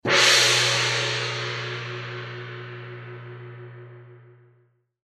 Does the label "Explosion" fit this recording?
No